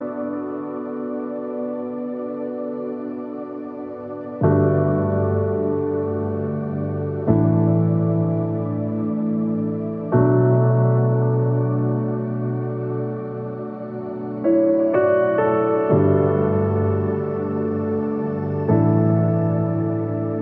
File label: Instrumental